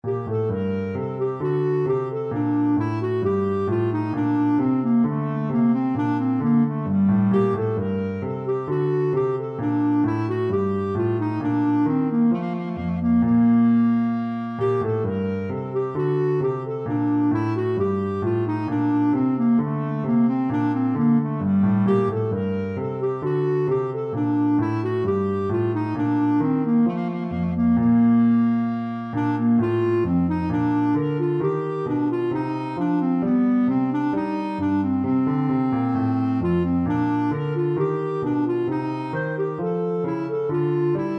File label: bourree clarinet; bach 996